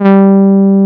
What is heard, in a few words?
soft brass; Greytsounds; Scott Peers Sound Engineering